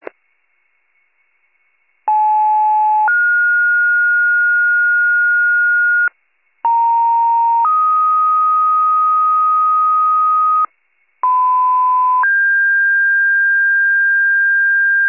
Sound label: fire, pager alert